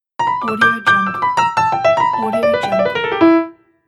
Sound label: acoustic piano shred virtuoso; redoctopus